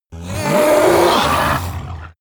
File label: monster monster scream; schreie